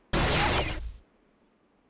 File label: sfx transform back; omnitrix; Ben 10 - Omnicode